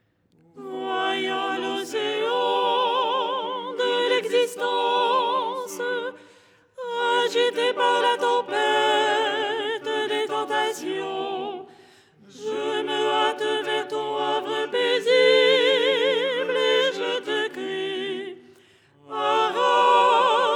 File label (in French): soprano; 6ème hirmos du canon de la Pannykhide; Les 8 tons en français